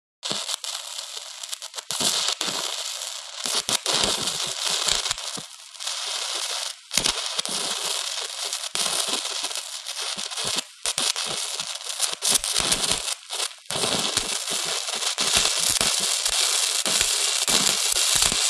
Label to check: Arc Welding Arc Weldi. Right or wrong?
Right